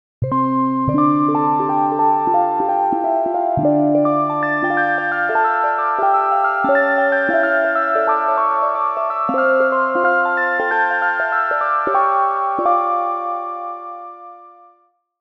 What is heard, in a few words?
Echo Piano, Jupiter8; OPXPROII; opxpro2